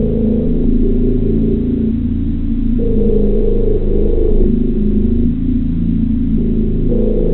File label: man lab drone; co skora